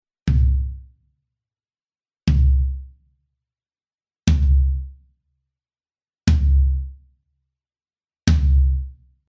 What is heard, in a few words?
FLOOR TOM GROUP